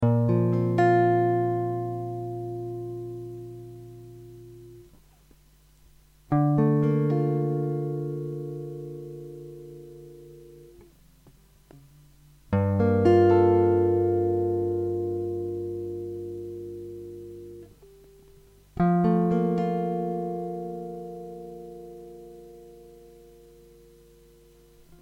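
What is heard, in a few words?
F 115 four guitar chords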